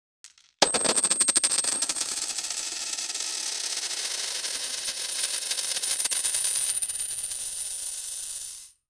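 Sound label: sudden treasure chest burst, gold coins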